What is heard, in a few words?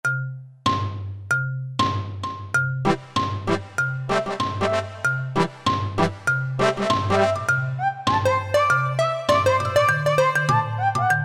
Split Combi, Roland U-220; Factory Patches